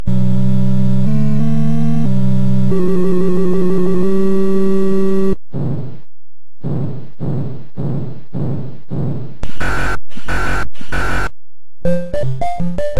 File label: 8-Bit Opening Song; video games; Donkey Kong